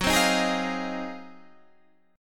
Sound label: Ab 13th Gb